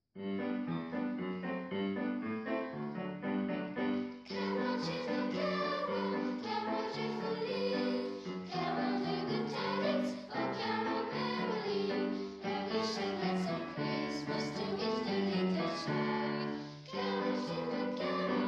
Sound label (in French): carol chanson trad. anglaise; children carol